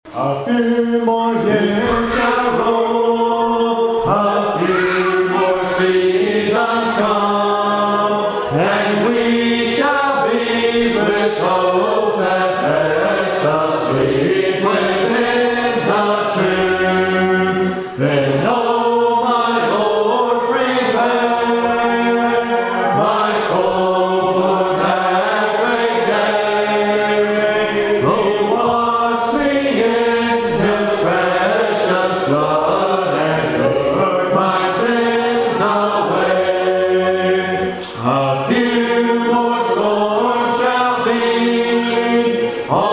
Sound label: Pilgrims Song